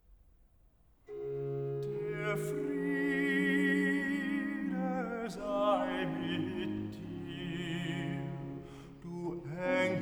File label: Recitativo Bass, Der Friede sei mit dir; Bach; Cantatas; BWV 158